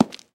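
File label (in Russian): чёткий короткий шаг каблуками по деревянному полу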